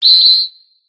American football; Sfx Americanfootball

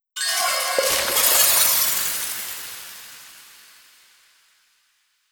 Frozen Rose; Enchant

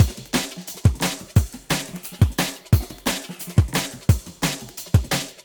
Synth (Drumlayer)

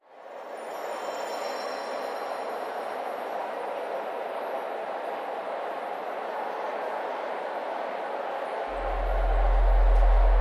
Intro Foley; King of Ping Pong Megamix

